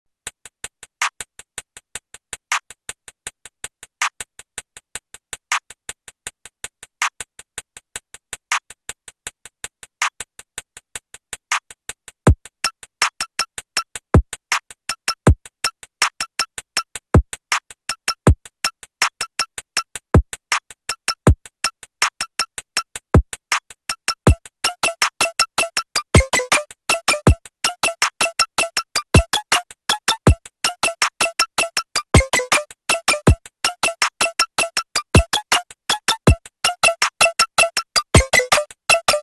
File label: sound of drums